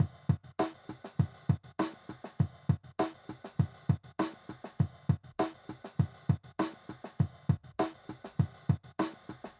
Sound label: beat